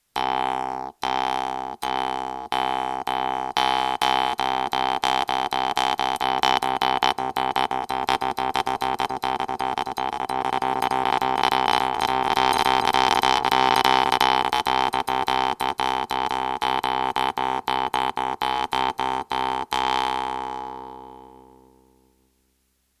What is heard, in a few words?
Tremolo